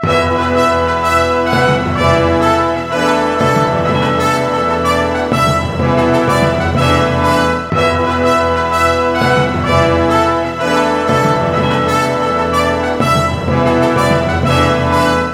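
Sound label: 125, orchestra; East West; Scoring Tools; Crusade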